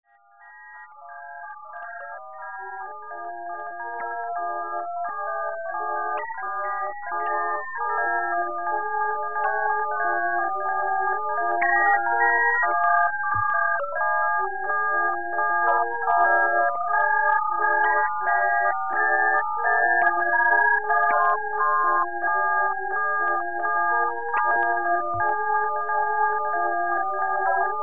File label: sinister electro loop